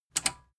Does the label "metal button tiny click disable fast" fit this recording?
Yes